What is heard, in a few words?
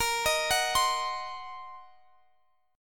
Eb Minor 6th Bb, guitar chord